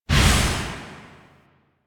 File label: Scythe Proj By; DE; Warframe; Weapons; Archwing; Melee; Exalted Archwing Scythe